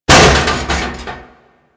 Frame Step; Enemies; DE; Warframe; Vay Hek

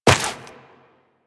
Step Dirt, Footsteps Walk; DE; Warframe; Tenno

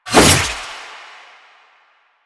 Sickle Whoosh; Warframe; Lasrian; Lasrian Soldiers; Weapons; Lasrian Butcher Sickle

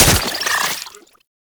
Spore Pistol Mech; Weapons

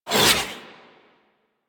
Warfan Whoosh; Warframe; Tenno; Broken Frame

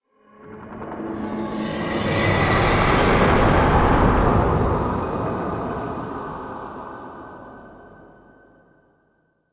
Spectral Whoosh; Ambience

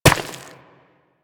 Run Rock, Footsteps; Warframe; Operator

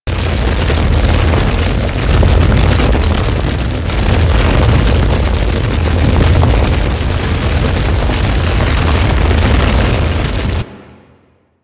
Movement Burn Loop, Vehicles; Warframe; Archwing

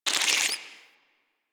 Alchemy Lab Insect; Warframe; Hub; Liset Alchemy Lab

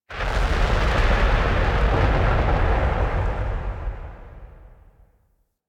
Gas City Distant Cloud Clap Explosions; Ambience; Warframe; Corpus Gas City

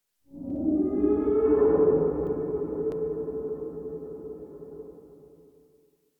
Exterior Distant Calls Scared, Ambience

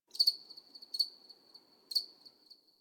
Insect Night; Warframe; Ambience; Eidolon Plains; One Offs

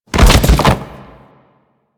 Light Fall E; Warframe; Grineer; BodyFalls

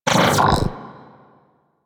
Mag Pull Cast; Warframe

